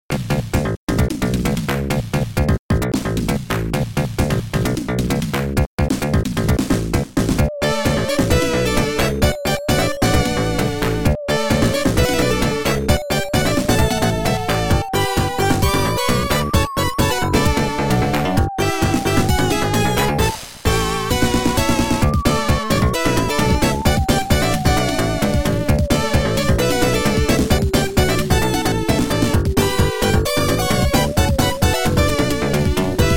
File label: slot machine bgm; sonicandknuckles